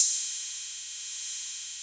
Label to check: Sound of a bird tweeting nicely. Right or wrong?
Wrong